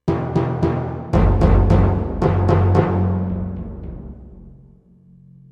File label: M-OC1 timpani